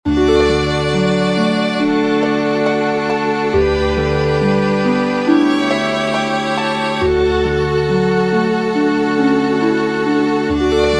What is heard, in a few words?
for harp strings; franck cesar; allegretto; pieces pour orgue